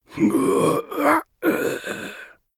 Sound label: Death